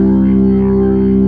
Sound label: B3 C1, R; Best Service; Advanced Media Trax Modern Composer; B3 SMALL1